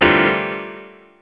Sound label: error